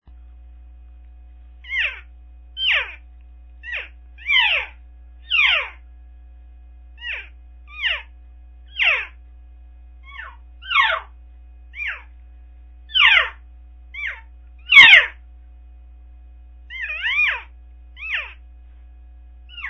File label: cow, elk; elk 2001